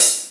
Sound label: Yamaha CS6, Drums